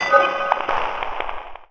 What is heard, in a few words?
TECHNO FX 140